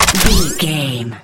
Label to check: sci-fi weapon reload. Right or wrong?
Right